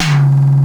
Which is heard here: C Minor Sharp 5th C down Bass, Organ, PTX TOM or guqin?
PTX TOM